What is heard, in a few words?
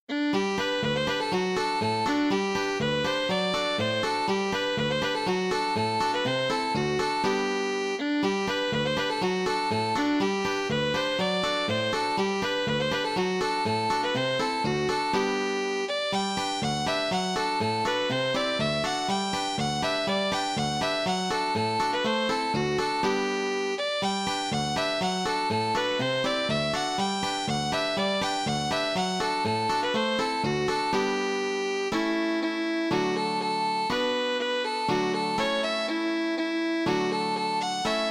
polka set